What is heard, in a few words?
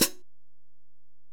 HiHat, Alesis DM-PRO